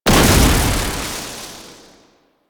Alchemy Grenade Explosion Electricity; Warframe; Entrati

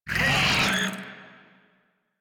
Desert Skate Vocal Pain A; Warframe

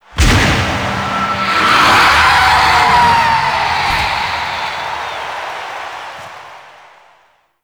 Stadium Disappear; Warframe; Nineteen Ninety Nine; Quests

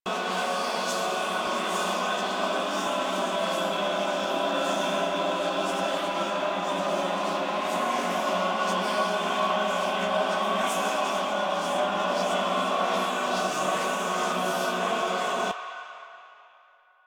Choir Eyes Loop; Warframe

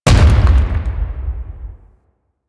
Thumper Bot Thumper Impact Close D; Warframe; Grineer

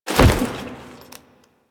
Foley Jump; Warframe; Kahl

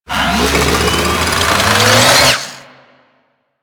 Saw Blade Launcher Charge; Warframe

